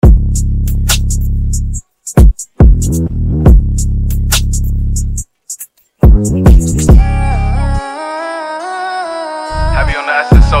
Trap Extended 70 BPM

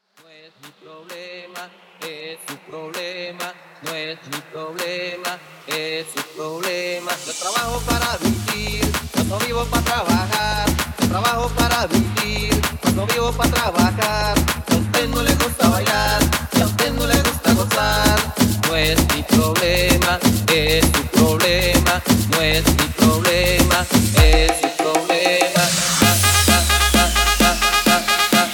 Guaracha 130 bpm

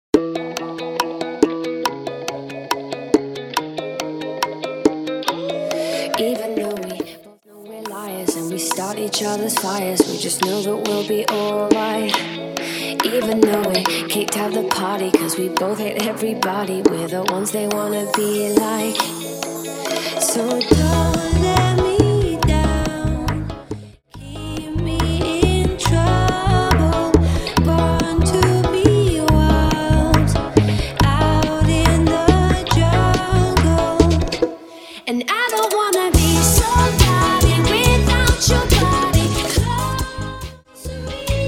TrapChata 140 BPM

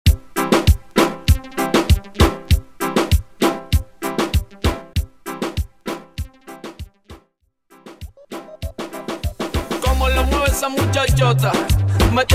Dembow Remix 98 BPM; Bootleg Dembow Remix

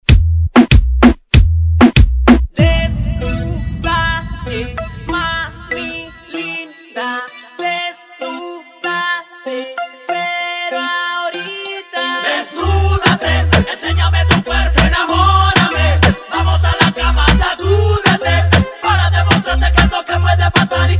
Old School Remix 96 BPM

